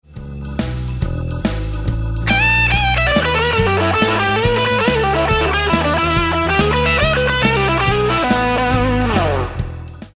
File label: Lick, guitar